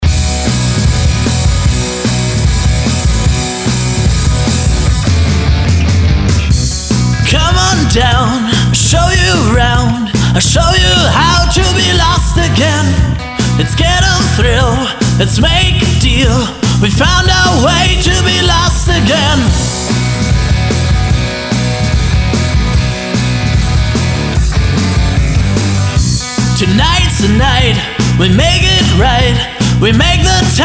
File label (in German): Saenger Rock